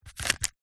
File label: leisure rubiks cube single turn